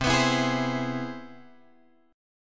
C Augmented Major 7th; C+M7 Chord {8 X 9 9 9 8} strummed

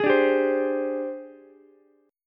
Ab Major 7th Flat 5th Ab, down, Ukulele; strummed; JGuitar

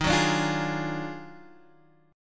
D sharp Minor Major 11th D sharp; strummed

